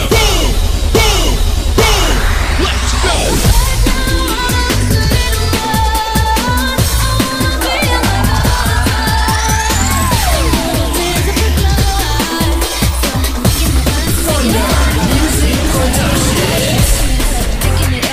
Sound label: Cheer Tryout Music; Wester MS